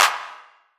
Claps; Vengeance Samples

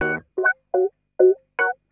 rhodes 125 F#, rhodes licks; house; PS-28 Vocal House Samples; instrumentloops

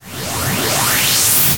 VFX Short Fills & Reverse

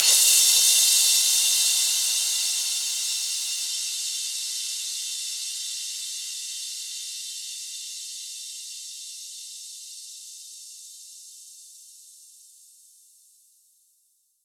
Crash; Vengeance Samples; VES1 Cymbals

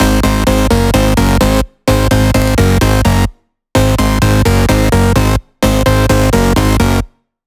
128BPM Flatbeat Melody; VFH1 128BPM Flatbeat Kit

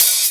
Open Hihat, Cymbals; Vengeance Samples

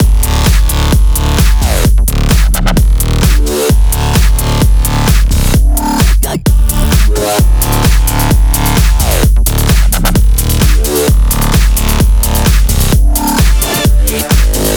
130BPM Mix; Vengeance Samples; Change Kit

